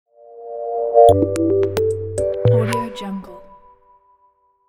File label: simple logo music